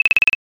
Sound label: scroll